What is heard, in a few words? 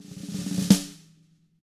ROLL, SNARE ROLLS; ILIO; Double Platinum Drums 1